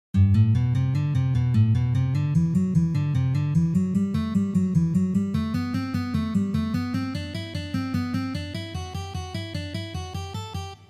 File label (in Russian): Uprazhnenie dlya igry gammy Mi minor